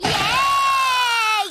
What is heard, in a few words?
yay